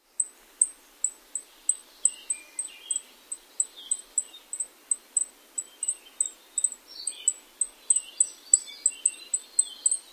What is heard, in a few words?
more calls